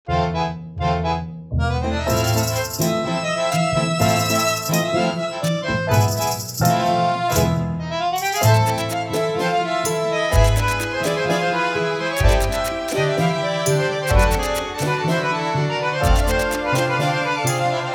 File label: Bank Robbing Tango